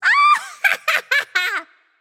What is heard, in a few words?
battle cry; smite; babayaga